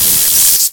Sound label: Stun gun